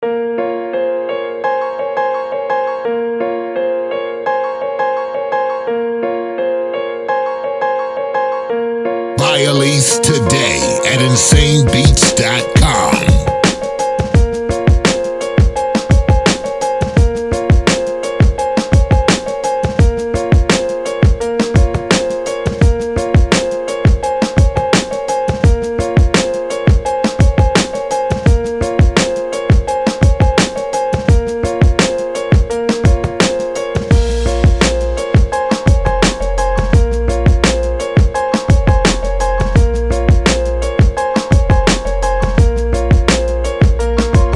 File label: sad rain beat